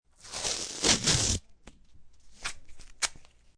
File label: Tape Pull Rip, Office tape being pulled and then ripped; Offices